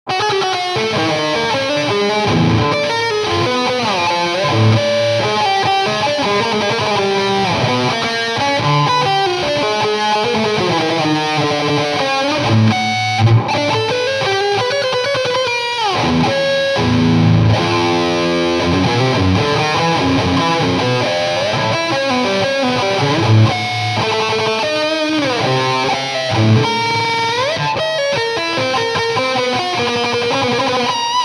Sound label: sonata for solo 17 tone guitar angular and aggressive